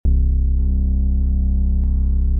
Bassic

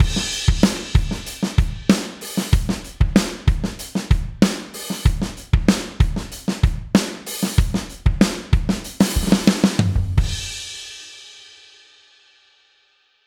Drums; UAD

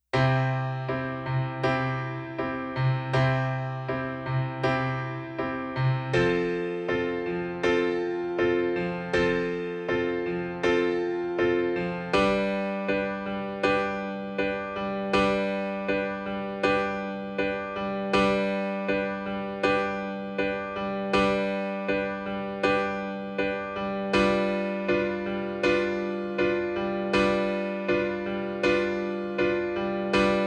c f g g g g g7 g7 g7 g7 c piano

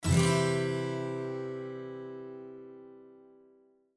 guitar

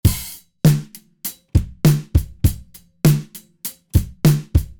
Brit Drum

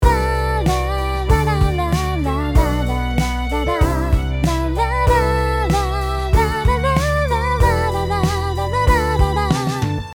Counter Rythm

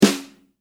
snare

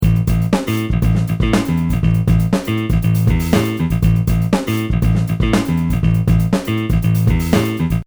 bass

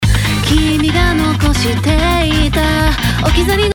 Guitar Cutting

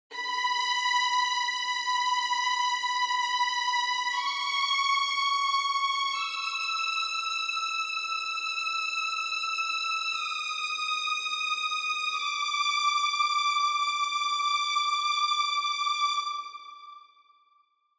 Vln

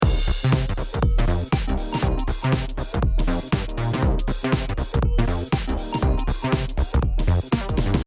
funk bass

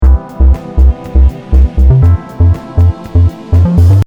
drums bass

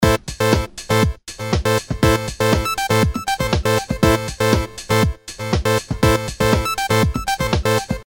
delay sound

